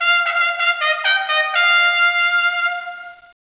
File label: fanfare; Caesar III